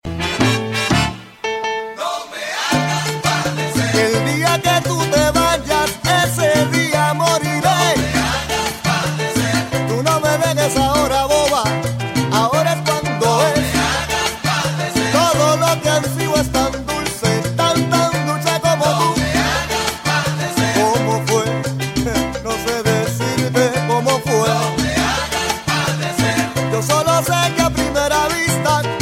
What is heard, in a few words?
salsa